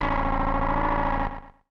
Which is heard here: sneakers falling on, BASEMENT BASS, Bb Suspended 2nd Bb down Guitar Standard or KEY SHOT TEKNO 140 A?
KEY SHOT TEKNO 140 A